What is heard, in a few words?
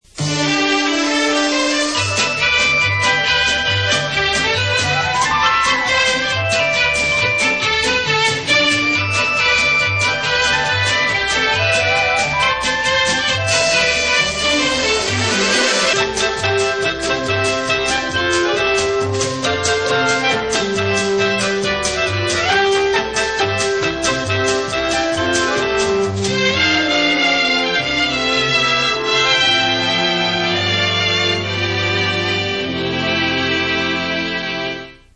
Jingle Bells